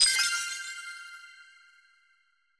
Diamond Pickup

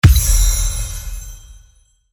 UI Normal Pop Up

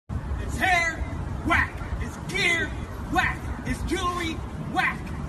whack

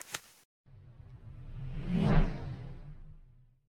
landing flyby

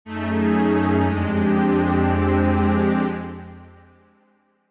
pvp lose sound

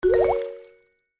success

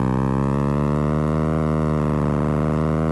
car loop

